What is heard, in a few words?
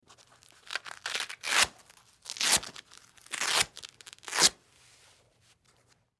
Police Bulletproof, Police Bulletproof Vest Take Off; Emergency; Emergency Vehicles